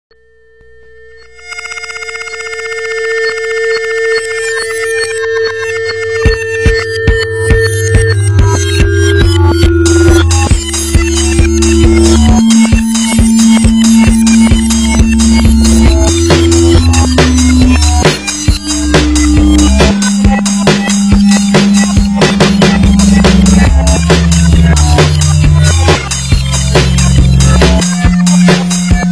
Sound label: instrumental